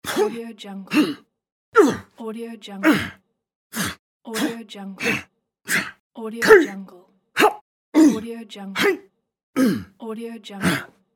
male grunt

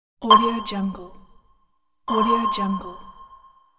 sonar

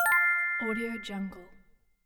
get coin; dulebas

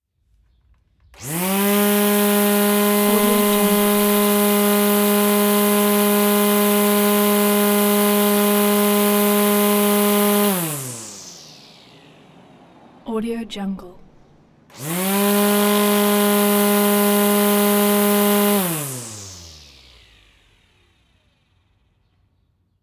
grass trimmer sharp motor buzz run stop twice

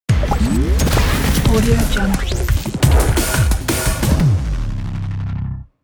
the logo intro

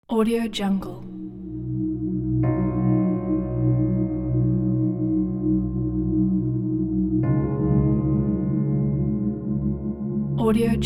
cinematic documentary; youtune

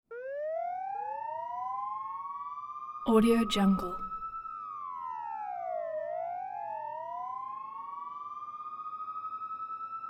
siren composite

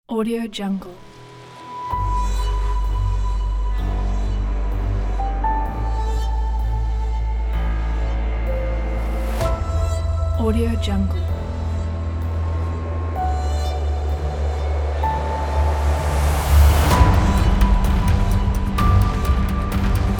trailer show intro

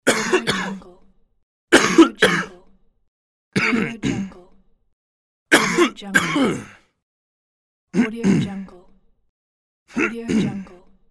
male cough

